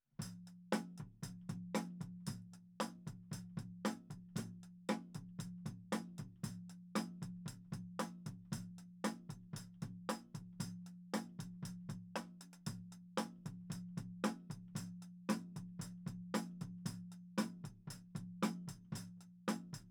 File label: Toms